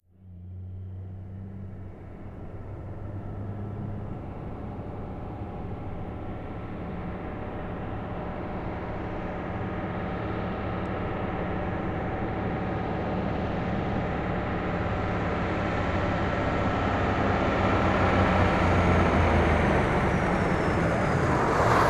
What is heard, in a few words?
18 Wheeler In Idle Stop; Vehicles; Cars & Trucks